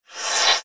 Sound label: spider jump; hatchet